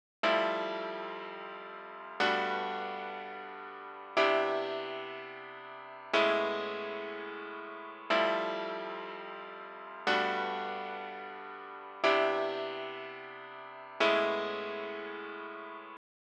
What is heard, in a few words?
122 Amin Piano